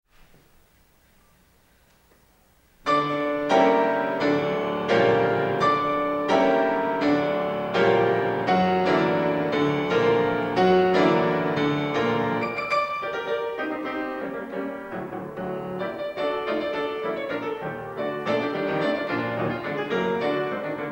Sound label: Etude Tableau in D Major, Piano; Rachmaninoff; Op39 No9